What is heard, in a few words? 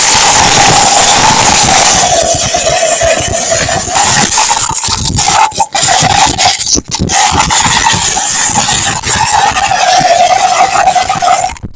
ambience